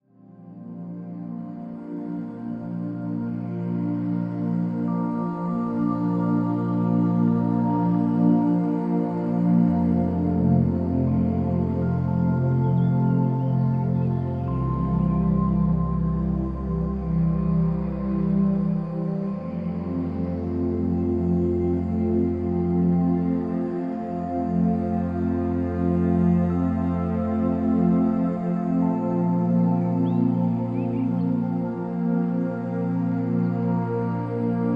Beautiful Relaxing Music for Stress Relief Calming Music Meditation Relaxation Sleep